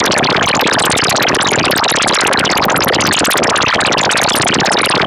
LAVA, BURBLY FX; Zero-G Ambient 1

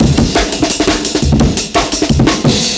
172 Break, Loops; M8

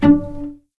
D3 PIZ CEL, string; East West; The Ultimate String Collection

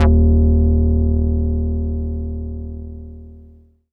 Funkster d#2, Korg Poly-800

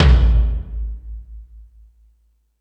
Stadium Rox, Drum Machines, E-MU Pro-Cussion

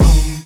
BD, Dance Mega Drums; Best Service

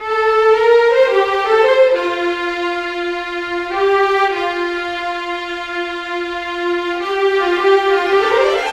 Viola, Violins, Orchestral, Rock Pop; Sonic Foundry (Sony Creative Software); Orchestral Series VOL-4 - Rock and Pop

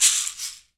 CAXIXI UP; Berimbau; E-MU Producer Series Vol. 2; More Studio Essentials; Composer; Berimbau Pallet

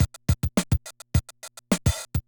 Loop, classic machines, rpm 40

